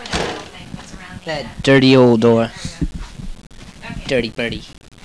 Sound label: dirty birdie